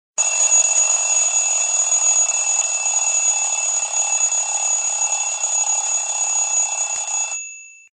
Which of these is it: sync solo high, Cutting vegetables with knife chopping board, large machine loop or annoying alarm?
annoying alarm